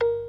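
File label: PERC D sharp 3; Best Service; Advanced Media Trax Modern Composer; B3 FULL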